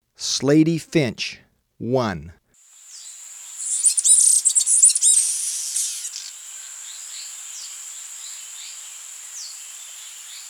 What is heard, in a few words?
Slaty Finch, Birds of Ecuador; S. America